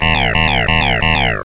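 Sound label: hack critical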